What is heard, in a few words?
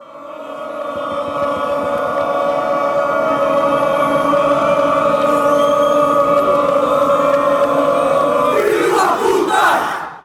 goal kick sob